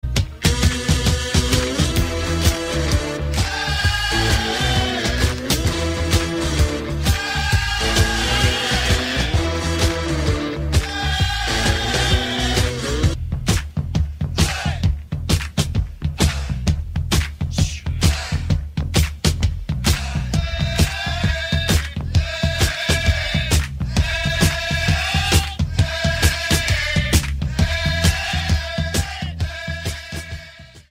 Rock Roll

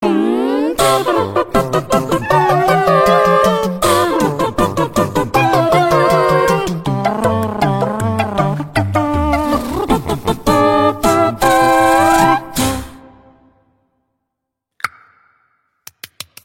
Acapella; Looney Tunes

